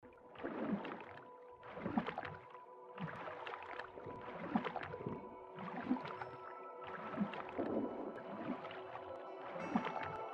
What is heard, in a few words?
soundscape water; gris